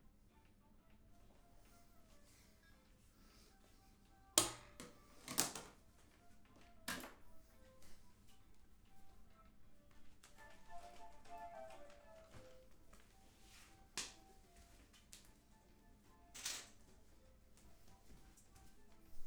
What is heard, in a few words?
flute